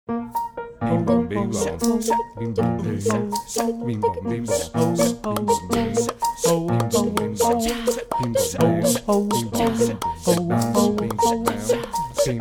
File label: Tribal piano